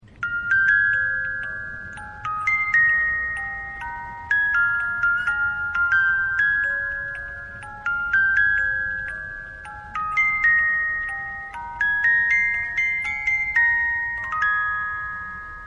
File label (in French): 18 lames, boite musique, manivelles